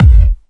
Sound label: Sub Kicks, Bassdrums